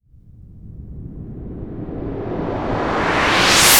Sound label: FX Sweep Up; Sweeps Up; Vengeance; Essential Clubsounds Vol.3